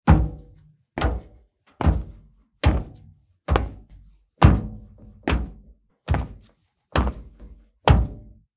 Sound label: Footsteps on metal ship deck walking